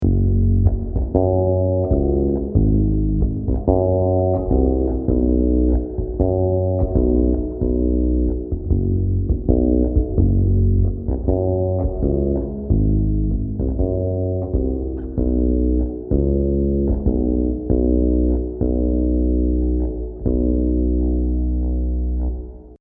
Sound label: bass reverb